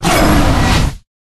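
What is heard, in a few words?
Dragon Skeleton block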